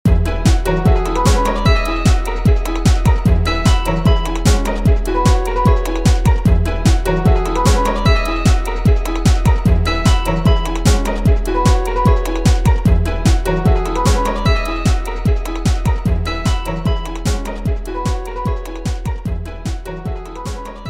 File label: Wind Runner (Paused); Wii Play Motion